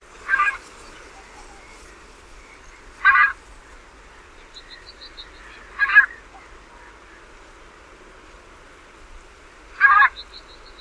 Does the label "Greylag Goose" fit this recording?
Yes